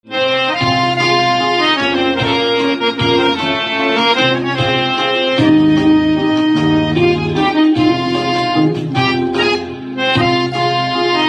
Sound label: Harmonium